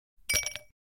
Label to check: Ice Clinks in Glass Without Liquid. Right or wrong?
Right